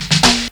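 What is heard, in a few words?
snare roll, Ragga & Dub Reggae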